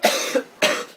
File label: Sick Healthy Coughs Cough